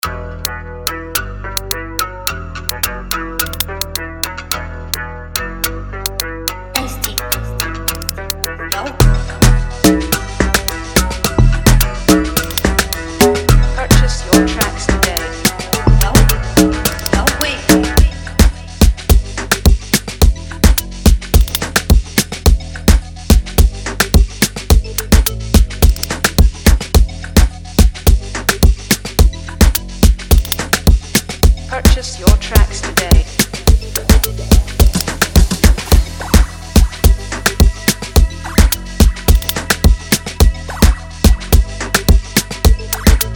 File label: FREEBEAT